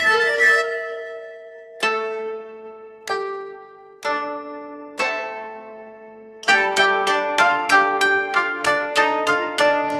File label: japanese traditional, musicgen